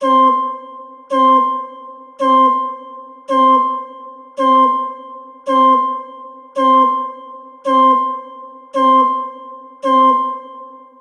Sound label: panic